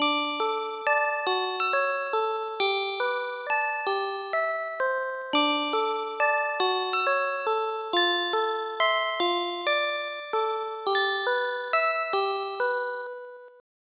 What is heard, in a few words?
Music Box; Night Manor